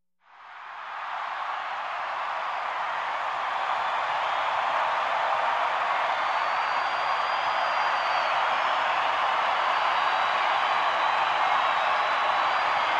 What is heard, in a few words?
Arena Crowd Whoos Lo, sports arena